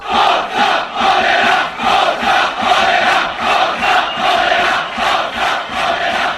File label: come on, chants; modena